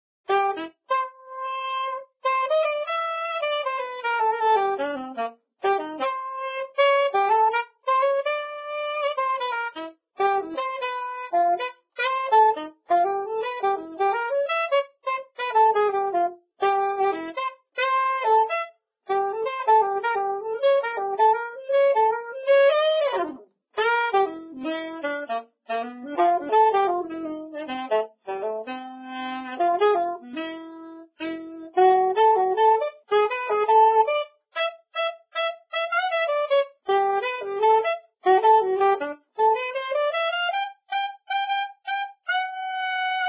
Saxophone Jazz Etude

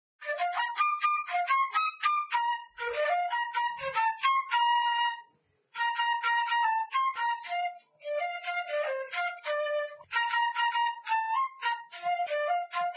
Flute; Instruments; Zomboid; Lifestyle

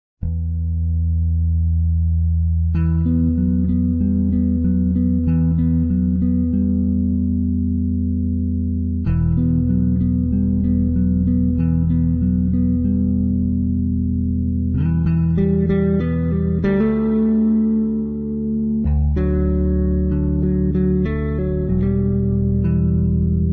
Guitar Electric Bass; Zomboid; Lifestyle

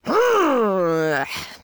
Woman Frustrated; Zomboid; Lifestyle

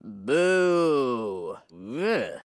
Man Booing; voice; Zomboid; Lifestyle

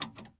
Jukebox Turn Off; Zomboid; Lifestyle

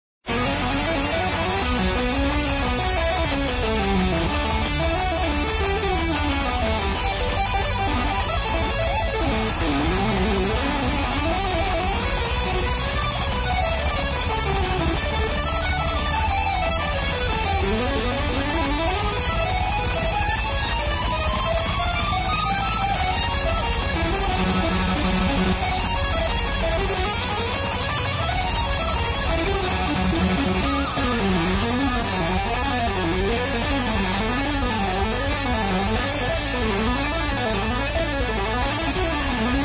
Keytar

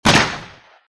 Firearm DEagle Shot; Firearms; Zomboid

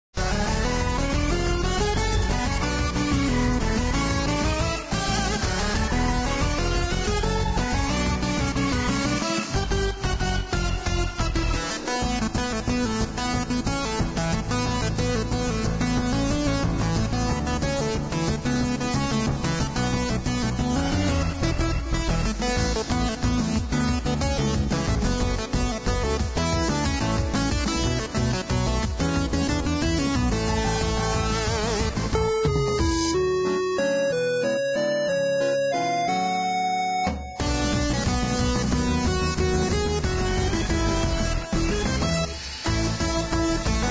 Keytar; Zomboid; Lifestyle

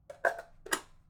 FAL Mag In; Zomboid; VFE

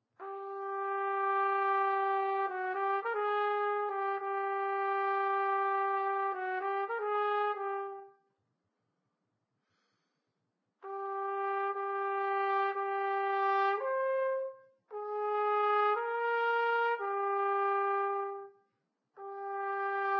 Trumpet; Don Pasquale

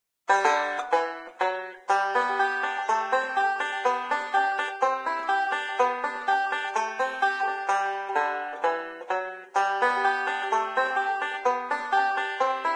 Banjo; Zomboid; Lifestyle

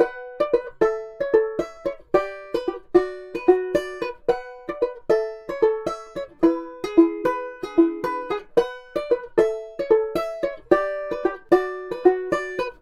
banjo; Zomboid; EntertainYourself

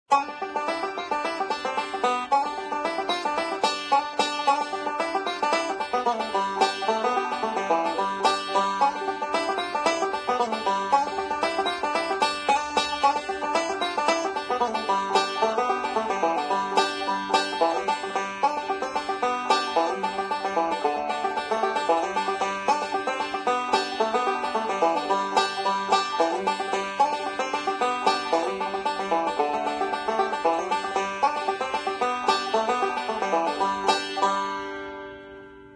Banjo Old Joe Clark; Instruments; Zomboid; Lifestyle

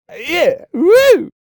Man Dance Happy; voice; Zomboid; Lifestyle

